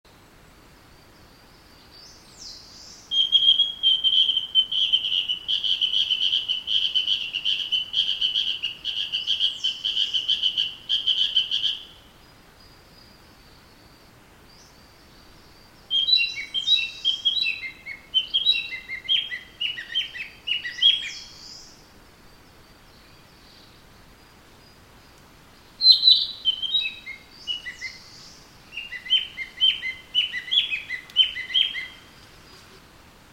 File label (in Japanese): kibitaki